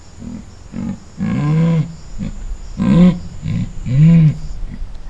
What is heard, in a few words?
jaguar; panthera onca; bosque seco